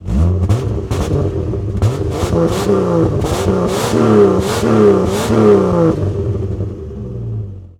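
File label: Truck Cherokee Rev; SFX Kit; Trucks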